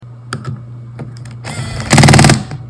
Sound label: jammed camera lens